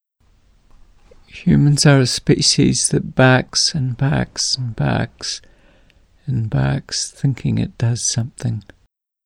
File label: humans barking